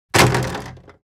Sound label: Large object drop heavy plastic impact; gfxsounds